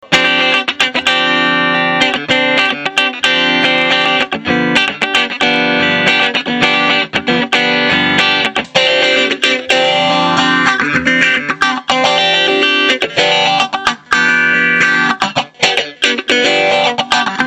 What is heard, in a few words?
slow vintage phaser; custom kit